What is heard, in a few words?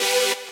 saw